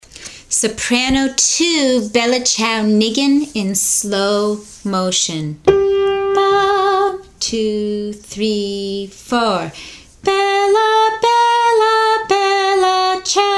Soprano II Slowly; Bella Ciao; Nign

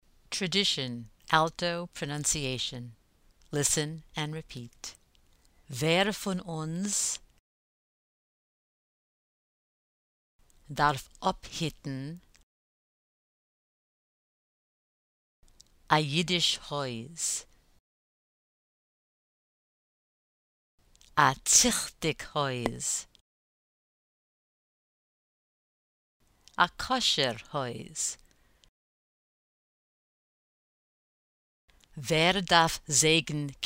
alto pronunciation drill; Listen and repeat

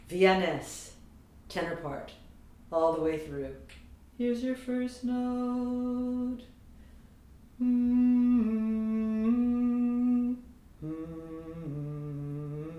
tenor; vi a nes

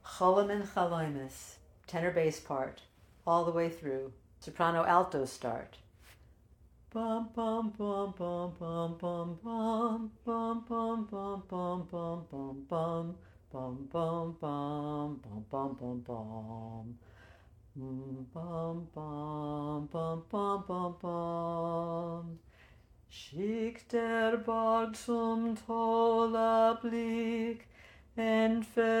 Tenor Bass, chorus; Kholemen

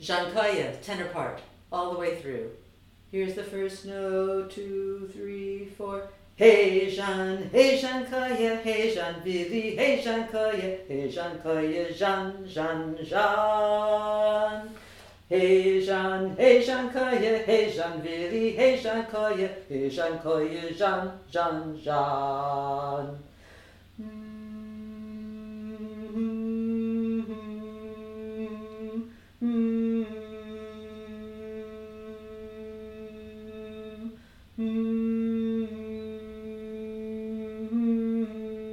tenor, chorus; Dzhankoye

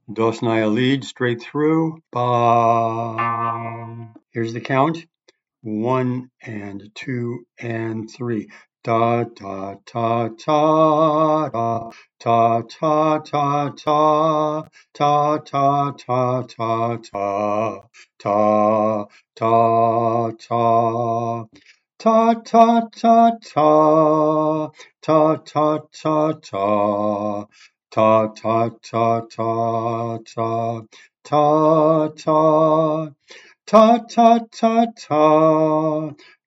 basses MONO, chorus; No lyrics

